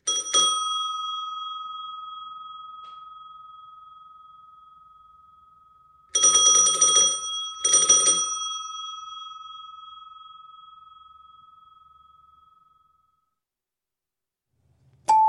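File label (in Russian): Zvonok v dveri, doorbell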